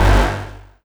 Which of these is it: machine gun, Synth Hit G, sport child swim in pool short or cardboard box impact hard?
Synth Hit G